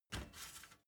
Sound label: step ladder metal soft single; schritte